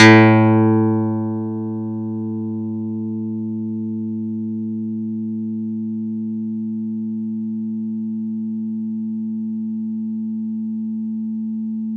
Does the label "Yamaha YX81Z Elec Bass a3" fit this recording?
Yes